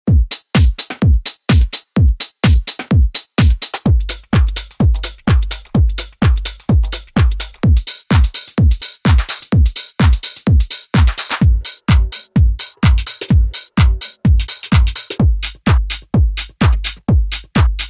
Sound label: minimal tech